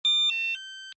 pager alarm, beeps

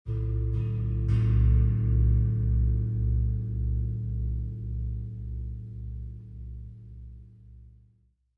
China 16 Cymbol Soft Tap

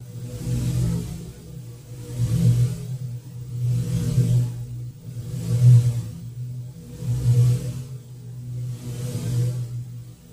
Heart Machine Loop, heartbeats & ekg

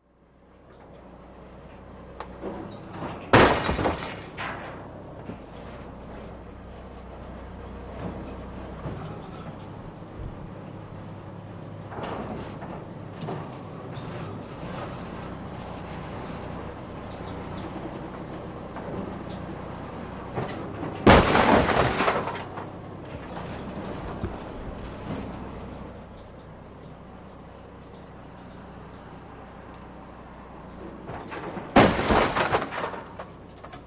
Auto Wrecker Ambienc, car crashes

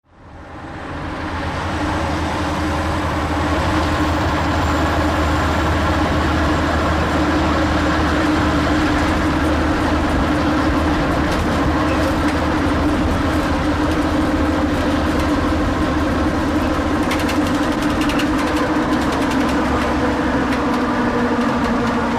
Truck Diesel Steady

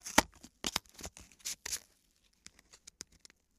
match tear out; lighters & matches

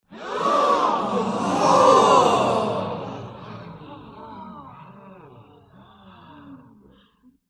Gasp Ooh Wonder Lrg Cr

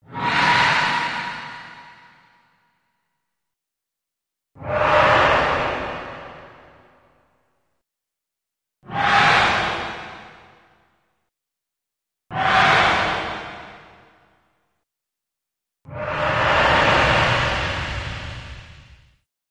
Metallic Whooshes

